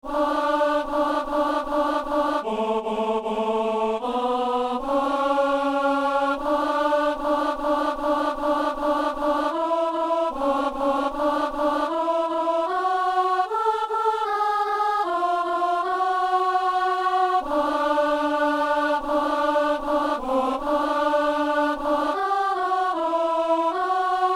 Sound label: alto